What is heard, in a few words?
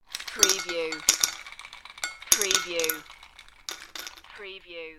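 Dropping Ice in Glass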